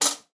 medical dentist mouth mirror or other metal tool place down